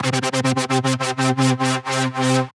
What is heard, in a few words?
C3 Synth Stop That